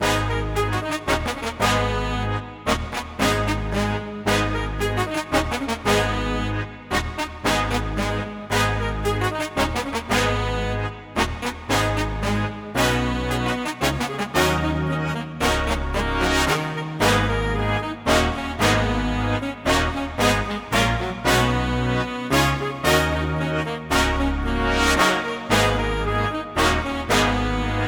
113 BPM G# Min, Brass; Melodies; Triumph; Cymatics; Destiny Premium Melodies (BETA); Destiny - BETA PACK

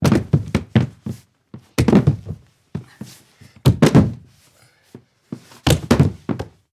Many Fumbles Hands; Fairlight Sound Lib

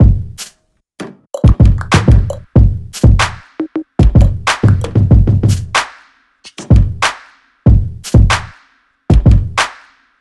Drum Loop 94 BPM; Drum Loops; Krank Drum Loop; Cymatics; Cymatics - King Drum Loops (Teaser)

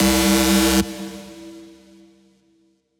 Techno Synth Shot C; Synth One-Shots; Ghosthack; 45 Free Techno Synth Shots 2025

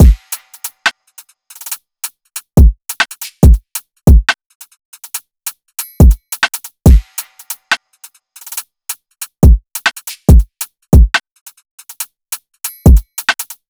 Drum Loop 140 BPM; Loop Stems; Cymatics; Mystery Pack Vol 4; Birmingham

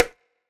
Plastic Perc; Cymatics; PHARAOH Beta Pack; Pharaoh Premium Drum Samples (BETA); Percussion

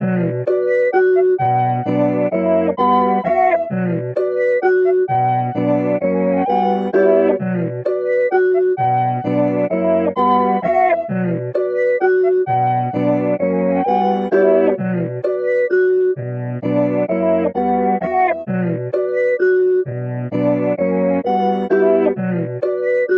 130 BPM B Min, Melodies; Cymatics; 2022 Melody Mystery Expansion

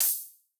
Hihat, open HiHats

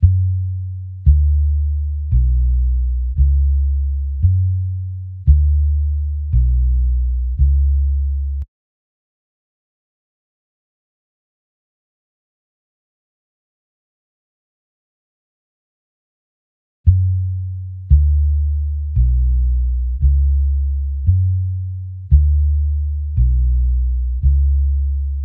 114 BPM F# Min Bass; Trap Melody Loops; Loop Stems; Cymatics; Nightmares; Fireflies

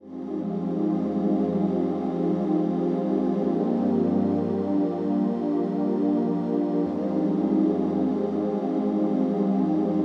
140 BPM C Min Pad, Ambient; Melodies; Loop Stems; Cymatics; GHOST (Beta Pack); Boulder

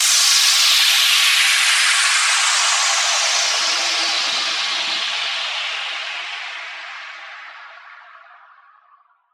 Riddim Downlifter False Chord; One Shots; Ghosthack; Riddim Essentials 2020

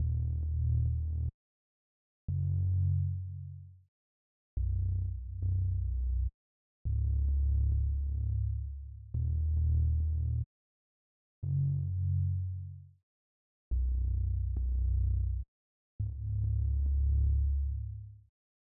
105 BPM D# Min Bass; Loop Stems; Cymatics; Infinity Melody Collection; New Ting

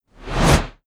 Whoosh

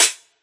Close Hi Hat, dubstep; Drum Shots; GhostHack; Demolition; Venomous

